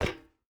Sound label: footstep boots metal run; Rust